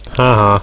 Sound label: haha; dmc